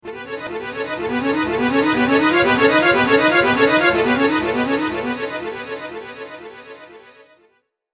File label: Jingle Fantasy; RPG Maker MV